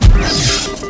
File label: MISSIL; MEGARACE